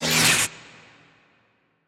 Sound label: Duel Live Thunder